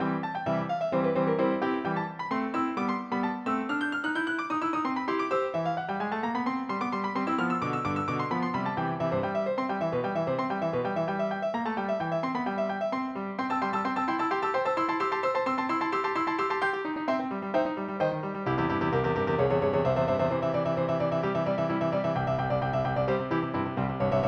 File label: virtuoso